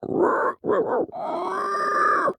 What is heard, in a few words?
pig long howl